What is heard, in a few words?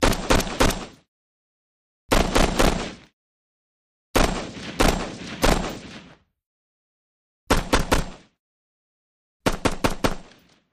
M16 Single Repeating